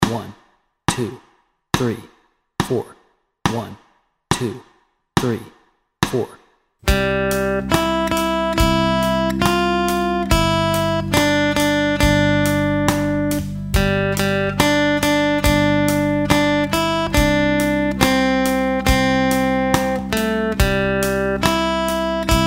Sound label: Hush Little Baby Melody Track, guitar